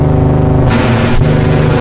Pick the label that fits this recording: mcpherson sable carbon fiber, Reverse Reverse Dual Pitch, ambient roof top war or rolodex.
ambient roof top war